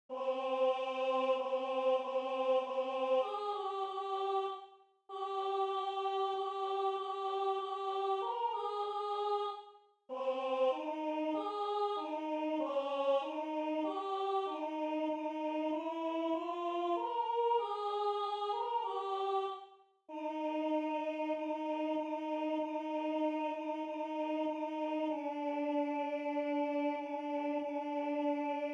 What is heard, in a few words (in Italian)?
Piu non si trovano alt